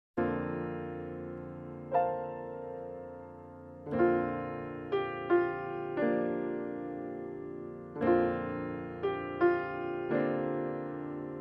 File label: Silent Night, Solo Piano